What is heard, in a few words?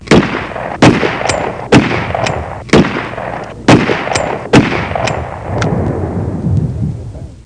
GUN